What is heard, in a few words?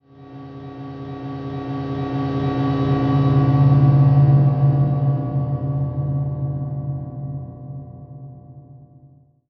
Title Transition Power Source; Production Element